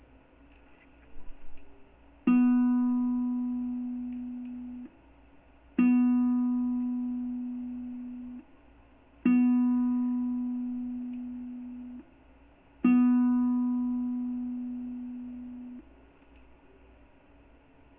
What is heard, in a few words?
B tune, ukulele